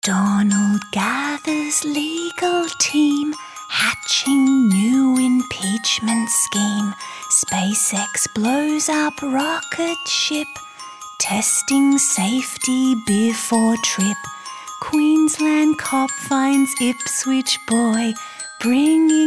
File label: Lullaby